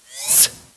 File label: Sfx Window Showup